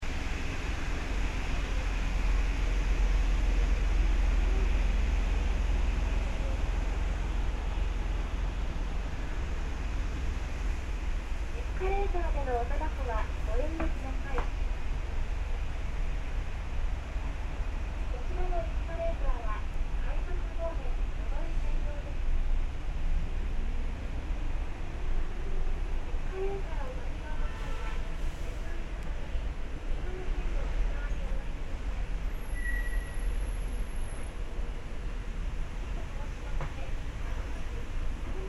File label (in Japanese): 駅ホーム外のエスカレーターの環境音